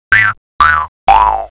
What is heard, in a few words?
chicken; My Animated Word Book; meal